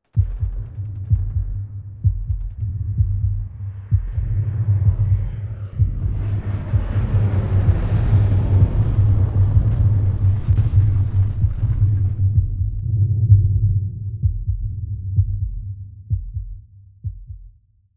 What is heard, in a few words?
Bombing Flyby